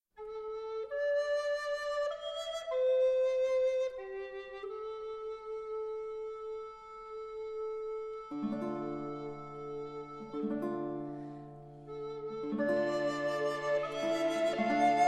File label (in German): Sinfonie in d-moll